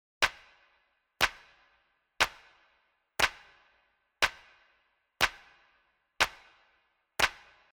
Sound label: Dirty Plate